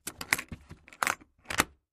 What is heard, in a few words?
Latch Close, trunks